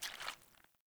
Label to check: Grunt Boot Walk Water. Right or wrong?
Right